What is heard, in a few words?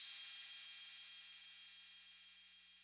Cymbal Accent Max Decay, 808